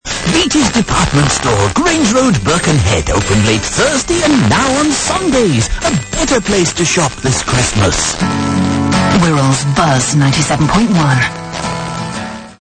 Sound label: Buzz